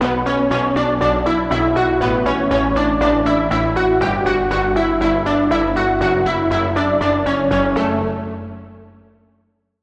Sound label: Key; Magenta River; Routed Sound; Vital Expansion - Reality Maker Vol.1